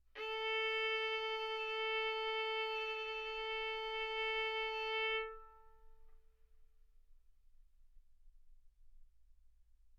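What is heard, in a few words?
Violin arco mf A string A4 B4 stereo; sul A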